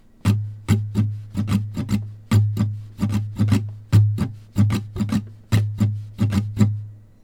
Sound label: jingle bell